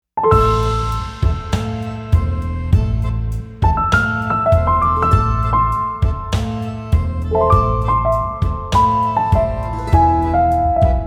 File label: electro retro lectro tango